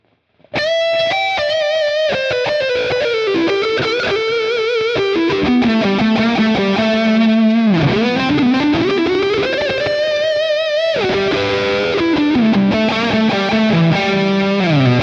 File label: Lead; PT Fire Breather